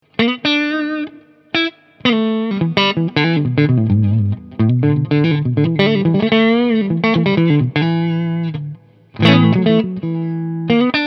Strat Clean Solo

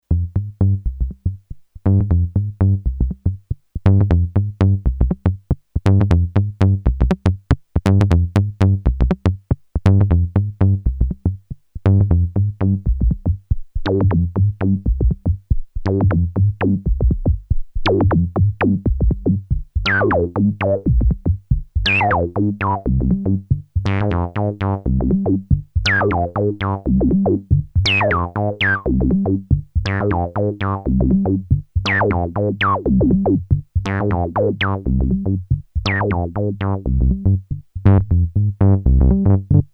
system8 Reso

